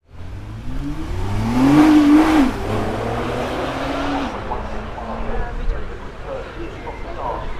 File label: nissan gt r; garage